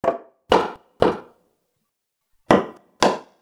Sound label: barricade; hammer; melee; tfa starwars melee weapons pack